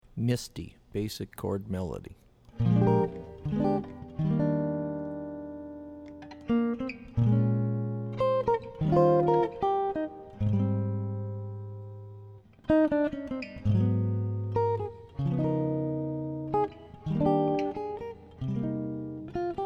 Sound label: Basic Chord Melody; jazz tunes